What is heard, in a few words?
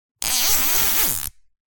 zip tie